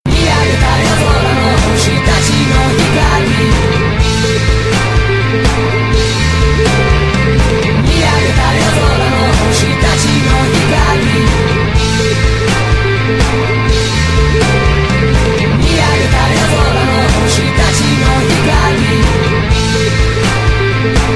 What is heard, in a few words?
ringtone; Bleach